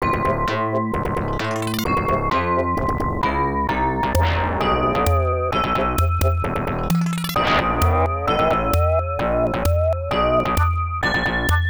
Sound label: Vibraphone; Bellville; Bricks and Water Scene; Cadash; arcade